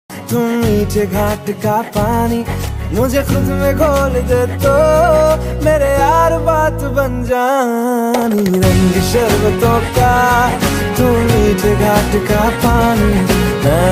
hindi song

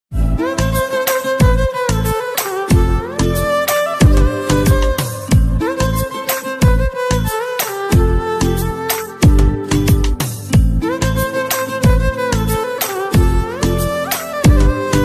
tamil love ringtone

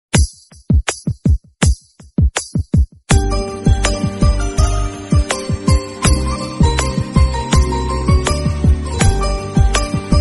love bgm ringtone tamil bgm

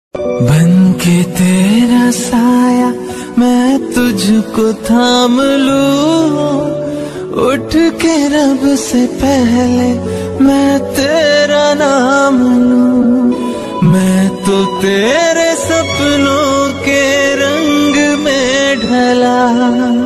hindi ringtone; mom

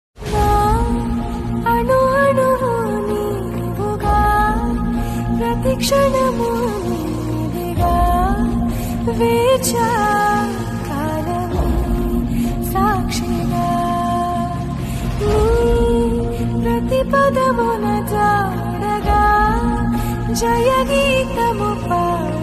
music melody ringtone

